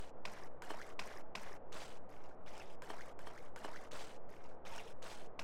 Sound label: Surface water